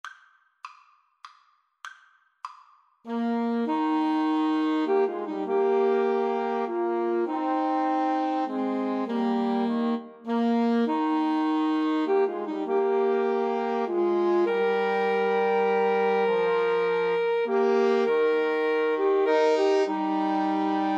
Piano Trio

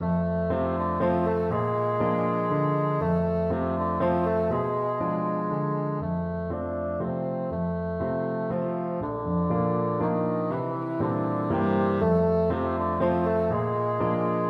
bassoon

